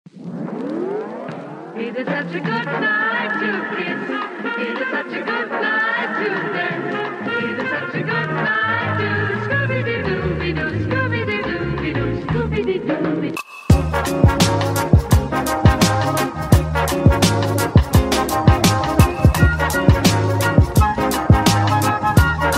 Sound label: jazz mix